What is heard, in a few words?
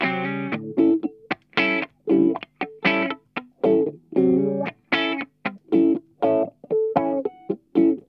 CROSSING, Funk Guitar; Zero G - Funk Guitar